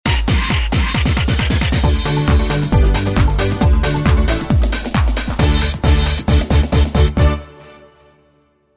melodiya vo vremya vystupleniya klouna; Звуки и музыка цирковая; весёлые клоунские мелодии

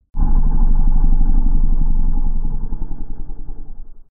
ryk drakona

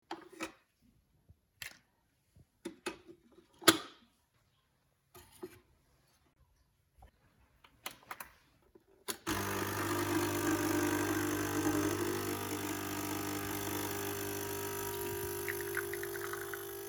zvuk kofemashyny

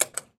najatie na knopku

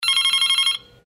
zvonok telefona zvuk

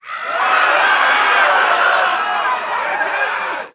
krik tolpy; Толпа кричит от испуга, восхищения, негодования

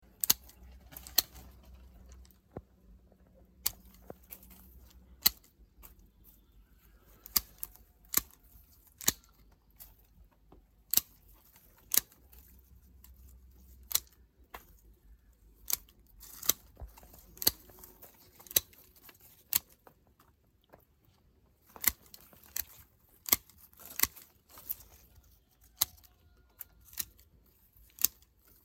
sekator kust, zvuk sadovyh sekatorov

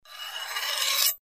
zvuki mecha

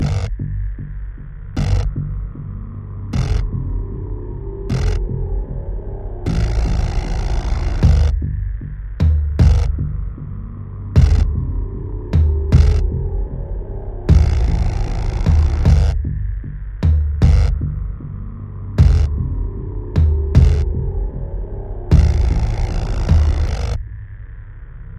muzyka dlya igr

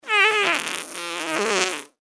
puk cheloveka; Издавание человеком неприличных, но естественных звуков портящих воздух

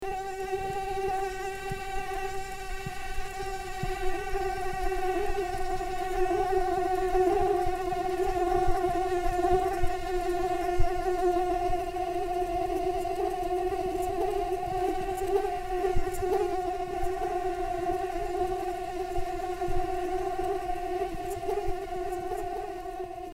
moshka

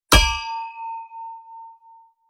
udar truby

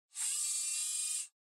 zoom fotoap

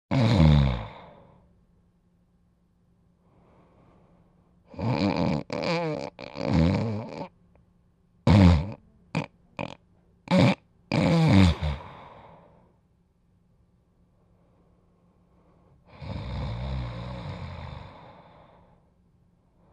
hrap

